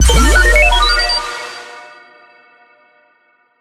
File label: ui save